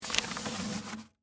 Mail Box Insert, Household, Mail, Box, Insert, Mail; Large, Envelope; Miscellaneous Household